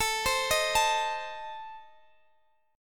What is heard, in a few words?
A Diminished, Guitar DADGAD; chord